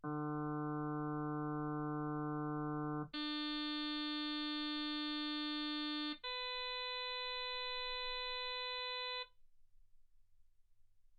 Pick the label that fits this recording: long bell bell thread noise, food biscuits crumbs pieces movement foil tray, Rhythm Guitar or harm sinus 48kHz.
harm sinus 48kHz